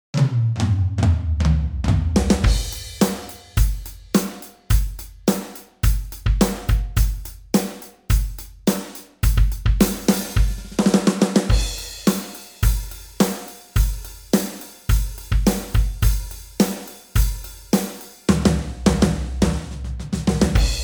handy drums rock standard; gorangrooves

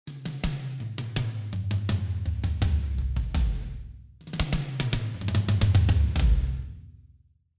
toms; helloween production kit; klangmacht

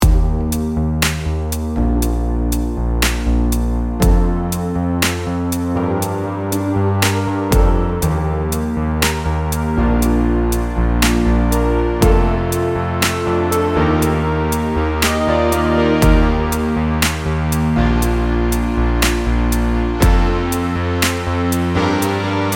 synthwave pluck

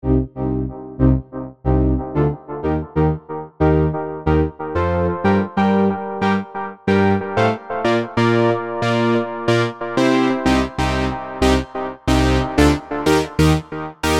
wobble bass; bluewave

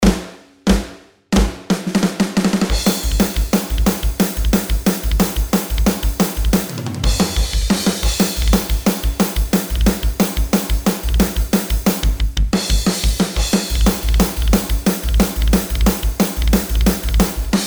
drums metal standard; gorangrooves; handy drums

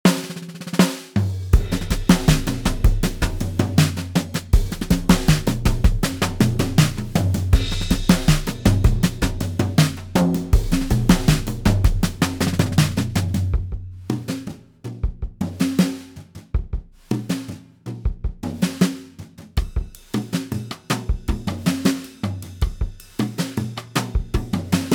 kit, clubdate drums; kensal 8; majetone

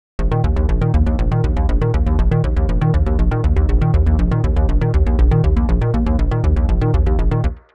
synth bass; the analog sound waves

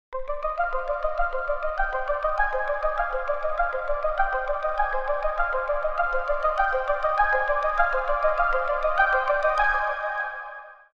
synth solar sequoia

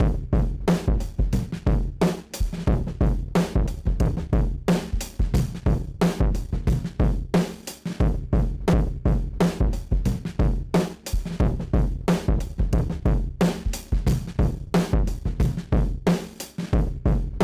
full slush plus collapse

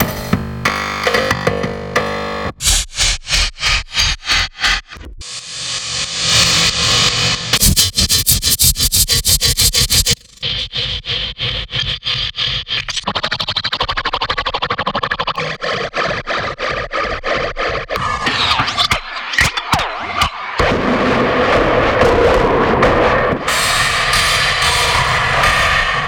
drumloop after; friendgineer labs

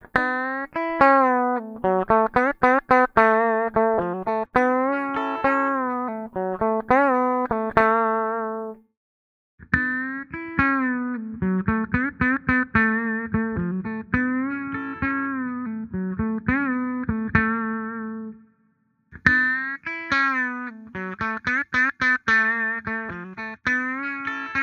clean guitar; amp sim noir tones; sonatura audio

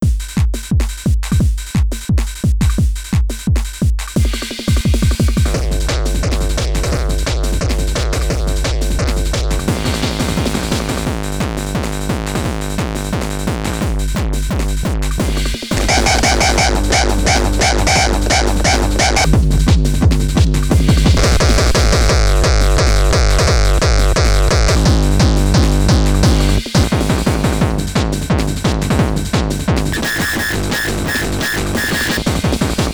kicks; multifxblaster by audioblast

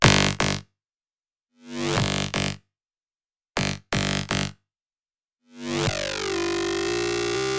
high bass processed; warm; kern audio

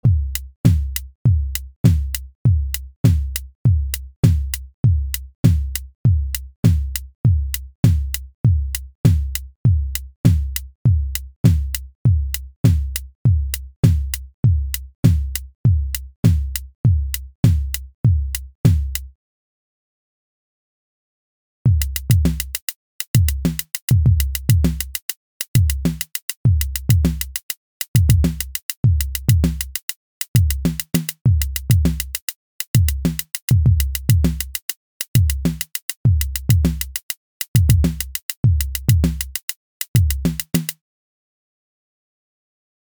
analog fusion drums popbeats; weathered electronic; mmpr technologies

